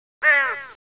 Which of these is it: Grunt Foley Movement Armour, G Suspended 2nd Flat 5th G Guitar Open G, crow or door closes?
crow